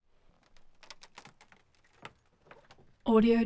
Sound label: wood splinter